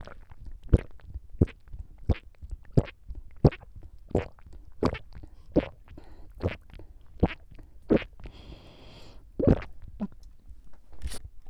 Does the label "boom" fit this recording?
No